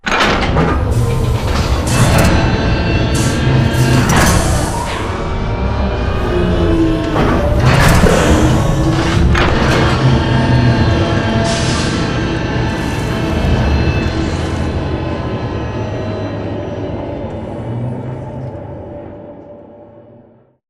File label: Above The Dome Robot Arm Prowler Pod Grab; Boomtown; Titanfall 2